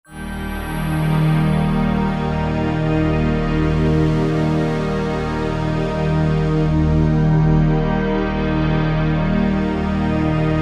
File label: The Big Pad